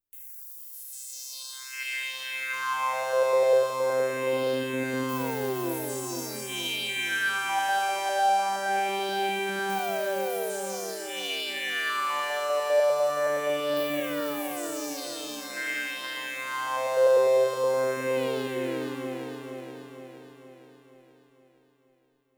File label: lazy pitch